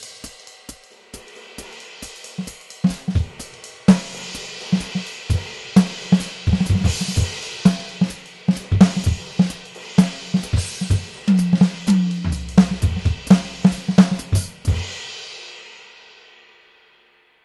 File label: Stockholm jazz